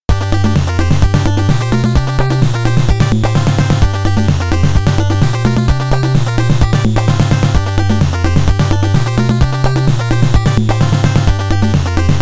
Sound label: Gun Stage BGM; konami famicom chronicle vol.3 rom cassette edition